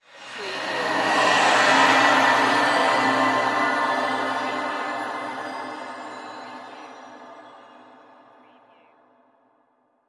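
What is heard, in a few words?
Production Element Feedback; Sound Effects Bible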